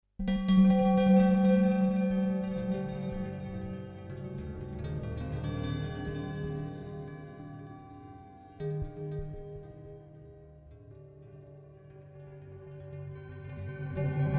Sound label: Chimes of Zen